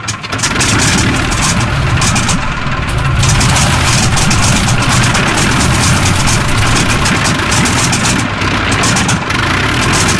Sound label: overheat layer; War Thunder